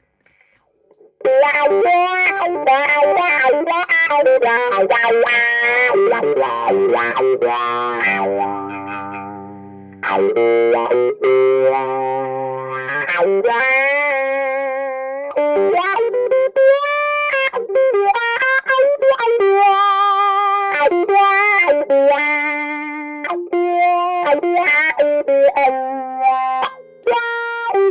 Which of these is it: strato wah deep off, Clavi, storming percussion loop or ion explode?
strato wah deep off